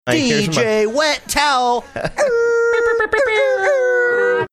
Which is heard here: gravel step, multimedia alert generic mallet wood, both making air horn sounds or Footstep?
both making air horn sounds